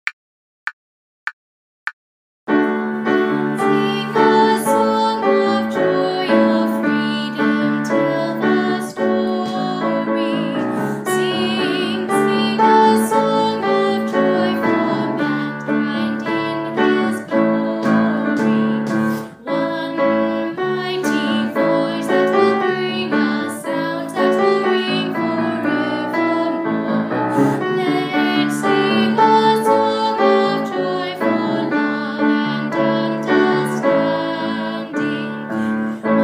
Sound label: Ode to Joy vocal guide; carols backing track